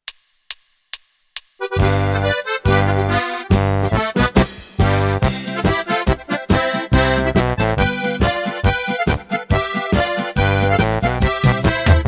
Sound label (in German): Polka mit Melodie